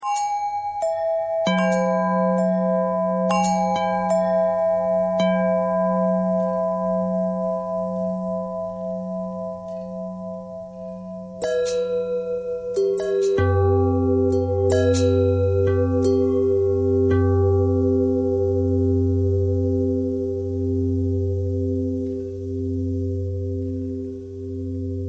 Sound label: bell longbell thread bendthread